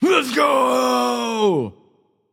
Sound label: battlecry; smite; raijin